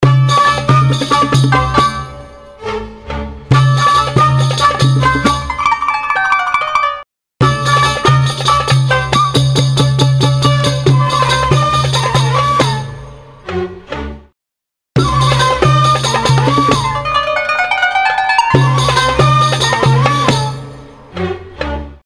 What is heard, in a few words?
oriental composer; music elements; grooves